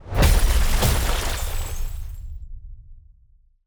Box Crystal Explosion Big; TANK; 3Souls

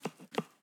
ambience room butchery food ready

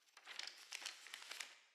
witch lodge book

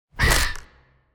Wood Item Throw; Unstable Bat; TANK; 3Souls

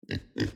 ambience room butchery pigs

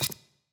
ambience room dark temple ritual stone ready

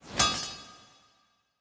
ambience room forge weapon ready

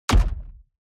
environment gold block destruction kick

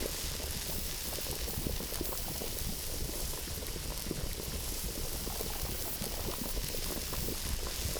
Water Sphere Effect; TANK; 3Souls

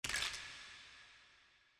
ambience room necromastery bones

